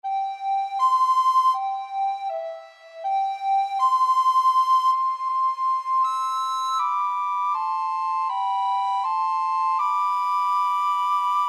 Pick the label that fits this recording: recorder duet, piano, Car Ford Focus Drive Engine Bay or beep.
recorder duet